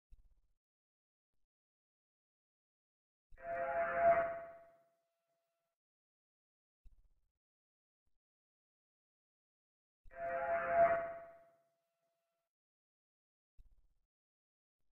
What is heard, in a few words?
quiet noise loop; baldis basics